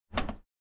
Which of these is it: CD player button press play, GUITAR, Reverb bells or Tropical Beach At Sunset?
CD player button press play